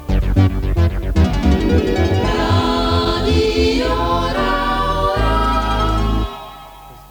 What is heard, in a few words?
jingle radio; raurach